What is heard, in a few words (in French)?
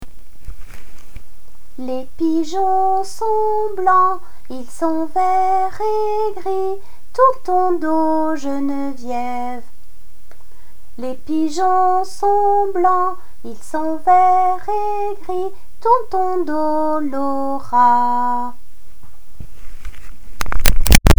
les pigeons, comptines